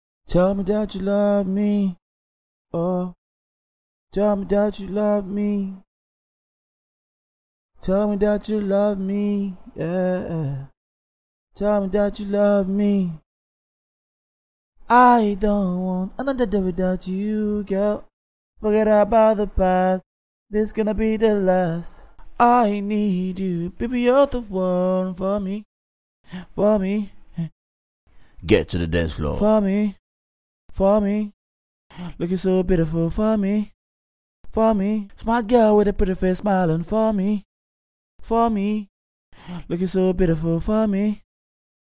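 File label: pop rnb 128 E major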